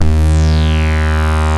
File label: REZ SYNC 1 D1; E-MU Formula 4000 Series Vol. 3; Analog Odyssey; VK+ Instruments